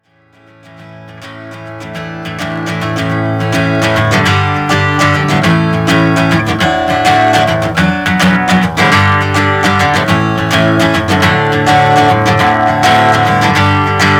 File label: Instrumental Excerpt